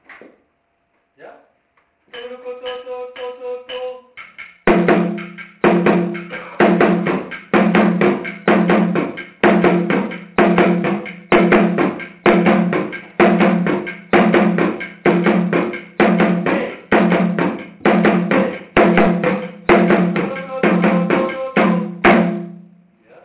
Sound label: Tiriba Kenkeni